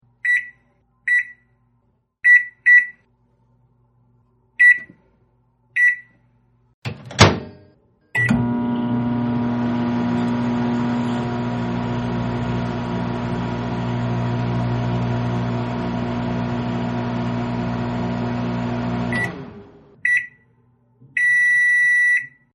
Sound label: Microwave, Microwave Turned On, Buzzes And Groans; Appliances; Household